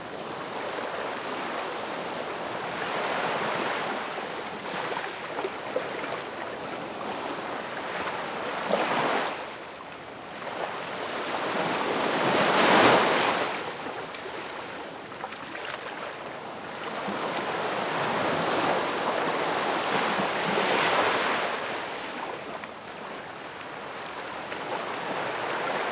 (Ambience) Beach

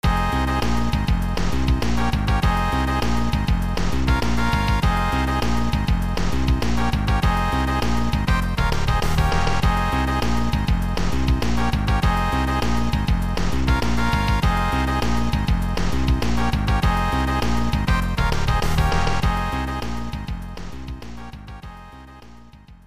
Motorboat Race Introduction

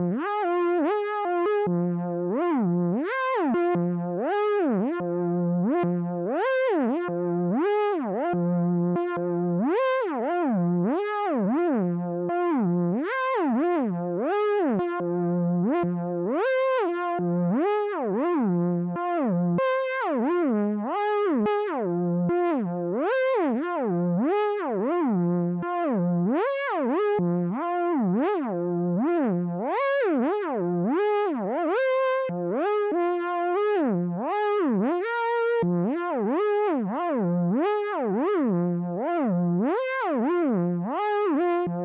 Combat Synth Stem; Necropolis

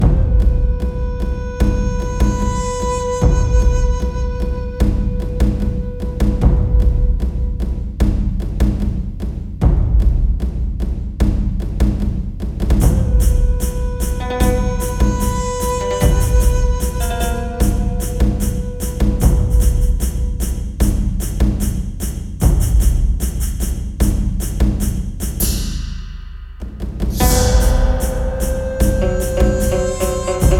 tension alt; Oriental Empires

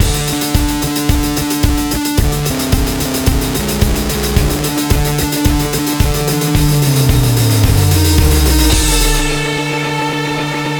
Zipline Phrase B 110bpm; battlefield hardline; ps3 ps4 windows xbox 360 xbox one

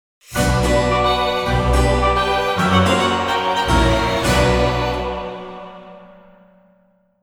Game Over, GAME OVER SCREEN

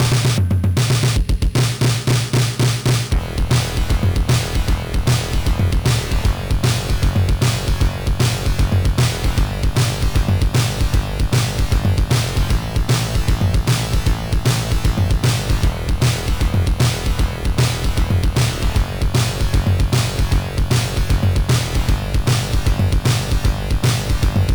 Swamp (Continue); N.A.R.C.; arcade

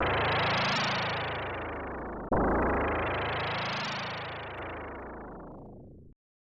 Mysterious Voice; Illusion of Gaia

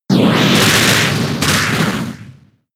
Sky Uppercut; Pokemon; gen 6; XY; ORAS

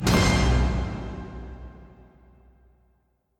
Mus Multi Jingle Ctf Getflag; Chronicles of Riddick Assault on Dark Athena

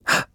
Emote Male Shocked; Idle Champions of the Forgotten Realms

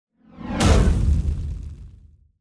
Raid Result; portal quest android

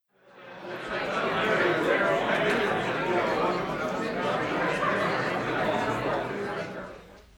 crowd medium intro; This Is the President

